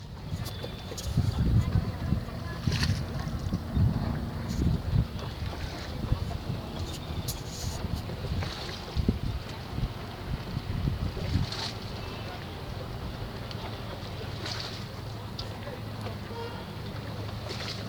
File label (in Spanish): Barca lago dal; SRINAGAR